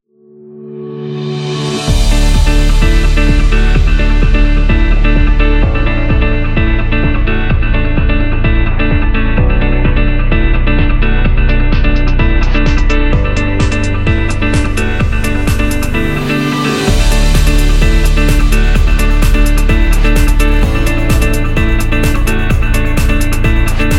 This Is Corporate

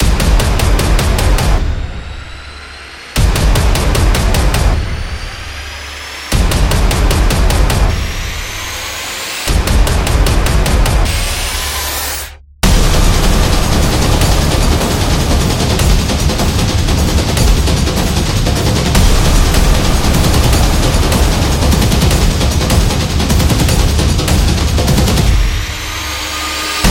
Sport Epic; battle drum

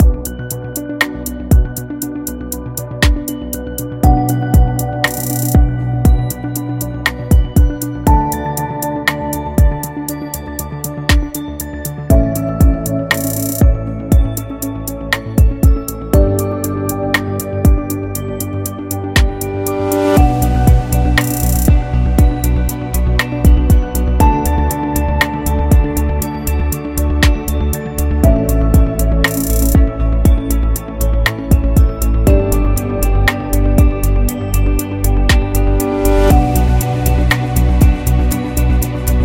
Ambient Technology; medical background

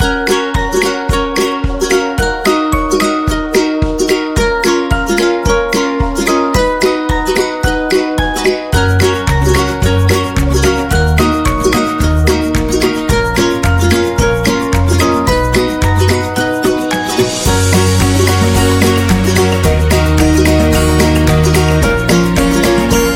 Uplifting Happy

